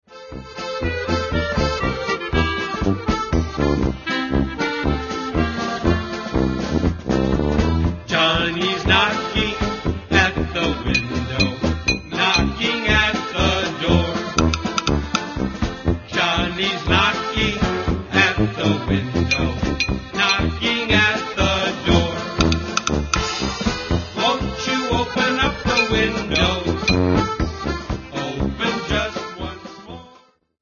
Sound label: Polish Polka; Medley